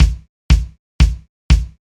kick

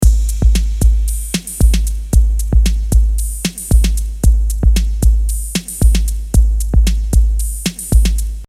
drums example

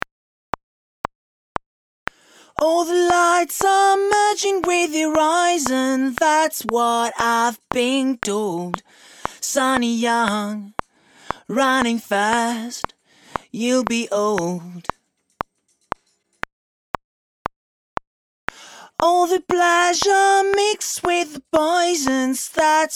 Vocal click

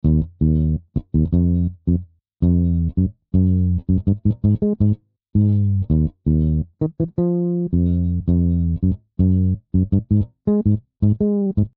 midi bass

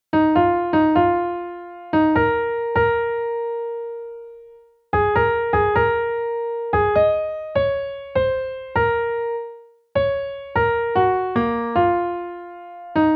melody

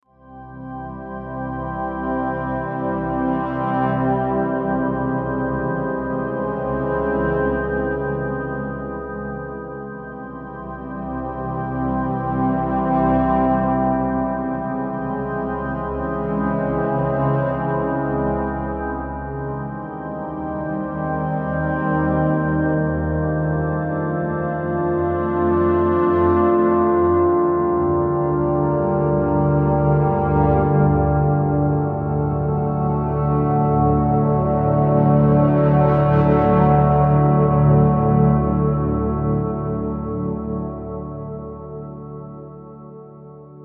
ambient pad

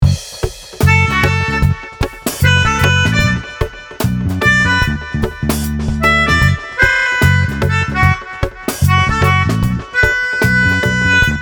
Logic Echo

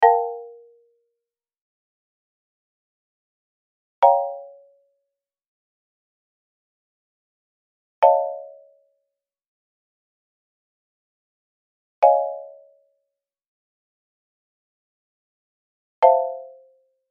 marimba

